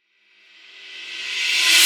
Zoom Reverse Cymbal; trap; FX; Cymatics; Trap Starter Pack

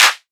Riddim Clap

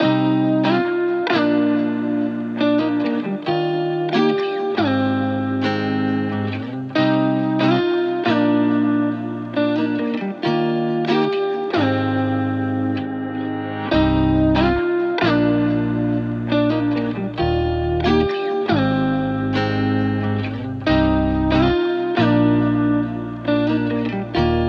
138 BPM C Min, Guitar; Beach; Cymatics; Miami Guitar Pack - Pt 1

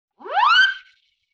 Wind Whistle Zip

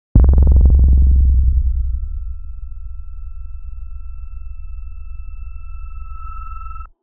Tension Bass, Hits

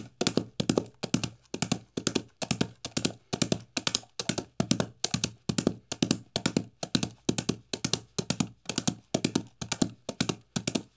Horse gallop hard dirt; Fairlight Sound Lib

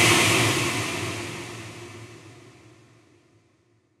Noise Impact

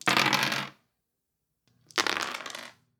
Dice Throwing; Dubstep; Ghosthack; Dubstep Sound Arsenal; Foley Percussions

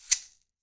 Shaker One Shot; Lofi; VYPR Yellow

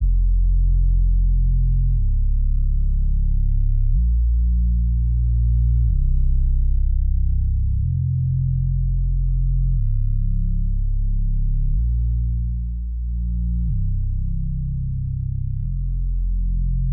123 BPM F Min Bass, Hip Hop; Melody Loops; Loop Stems; Cymatics; Vuelve

